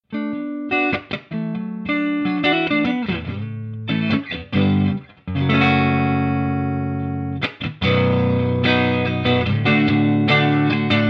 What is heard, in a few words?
clean